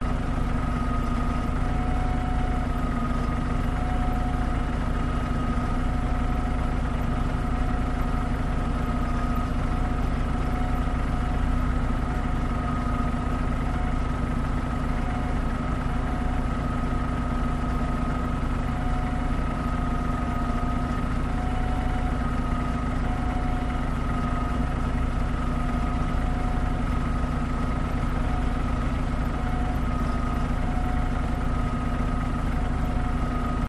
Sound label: idle bus engine exterior loop